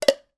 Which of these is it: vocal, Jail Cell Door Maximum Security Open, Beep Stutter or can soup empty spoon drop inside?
can soup empty spoon drop inside